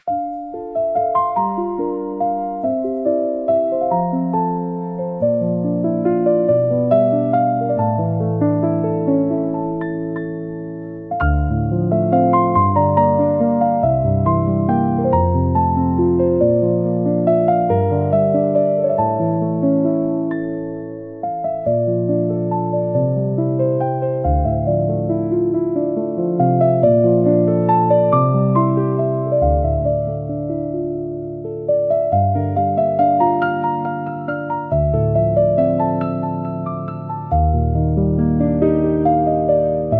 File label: calm piano